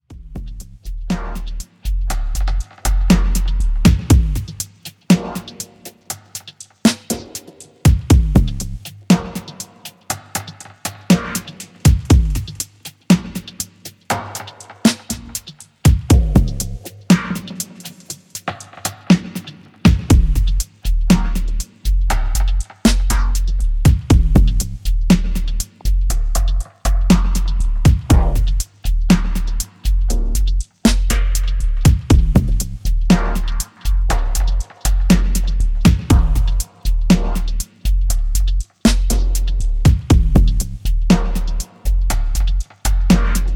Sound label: dub